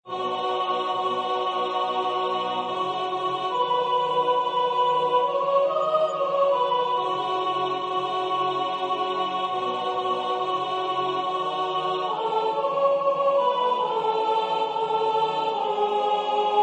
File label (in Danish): sopran; Yndigt dufter Danmark